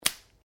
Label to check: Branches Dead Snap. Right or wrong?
Right